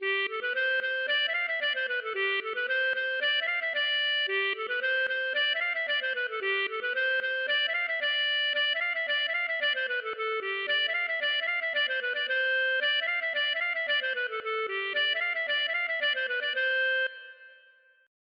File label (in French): bourree; Des Dindes